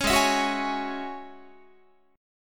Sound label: G sharp 7th Suspended 4th Sharp 5th G sharp, Guitar; G#7sus4#5; 16,16,19,16,17,19; strummed